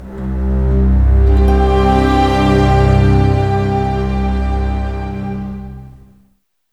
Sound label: CLOCK; East West; Scoring Tools; ORC; Clock Time